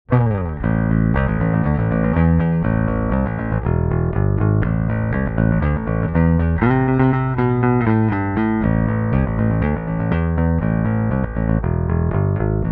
passive pick

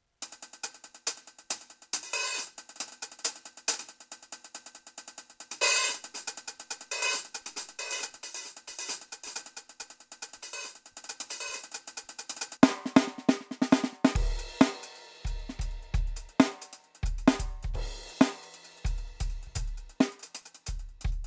YAMAHA DTX8K M Funk Kit

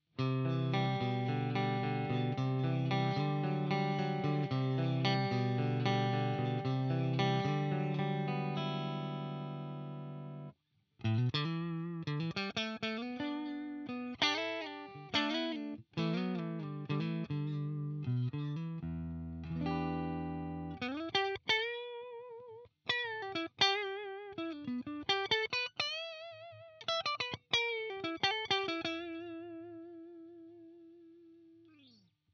mid CLEAN

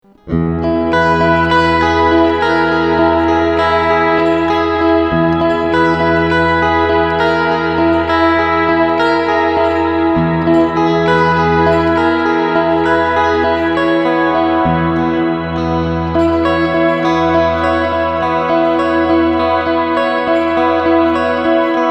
LINE Shimmer

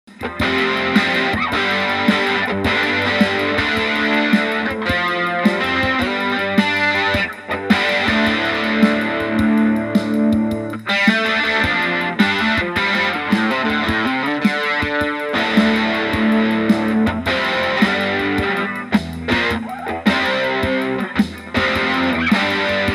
Vox Mini Go Marshall Sim. Chorus

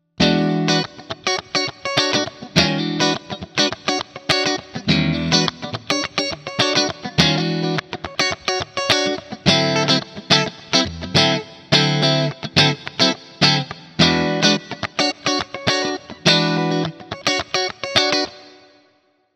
Fender AM Orig. 60 Neck and Middle clean

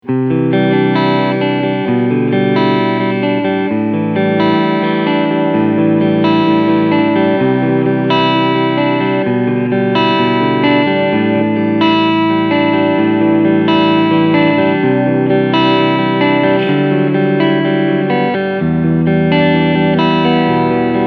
Cleansound Front PU

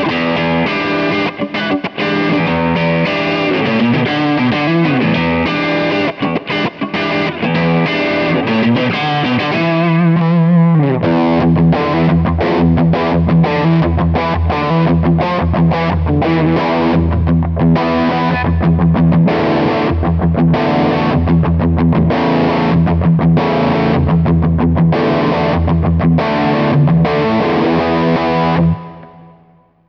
Tube Driver Hot; Harley Benton Dual Effect Evil Twin

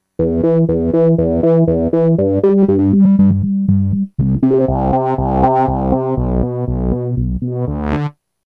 Synth Bass; MPK Mini Play

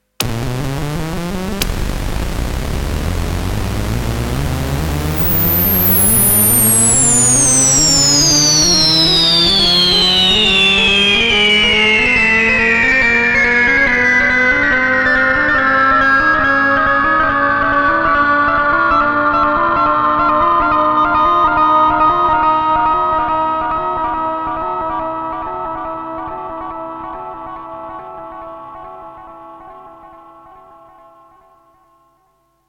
SFX Riser

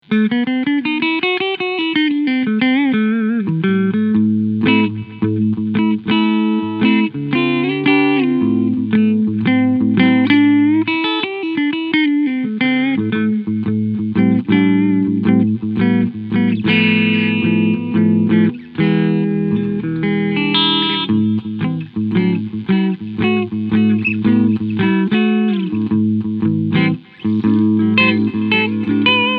Epiphone B.B. King Lucille Clean Front

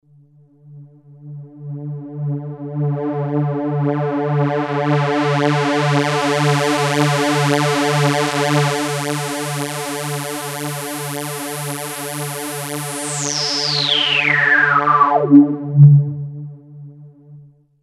Roland JP08 12dB Filter

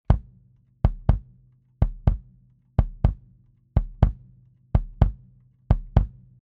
Shure SM7B Kick Out

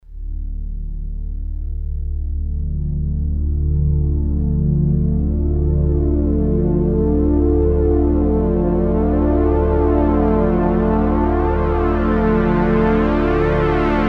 Wasp LP

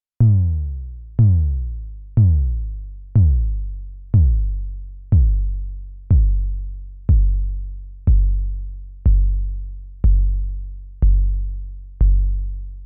Techno Kick Elektron Classic Kick

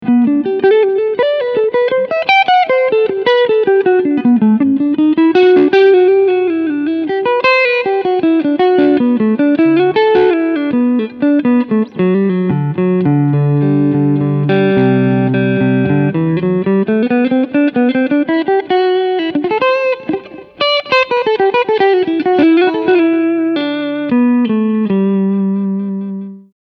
Clean Picking Front PU

